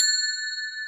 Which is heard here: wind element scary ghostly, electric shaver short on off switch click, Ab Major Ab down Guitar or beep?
beep